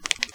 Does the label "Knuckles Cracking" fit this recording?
Yes